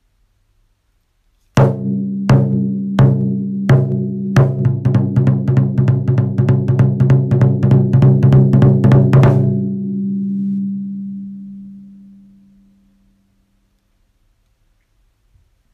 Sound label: taiko